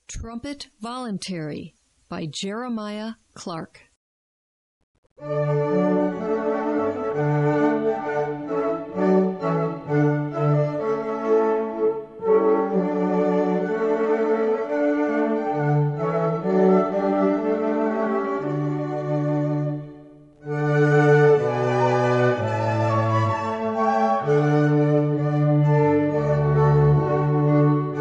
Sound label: Trumpet Voluntary Clarke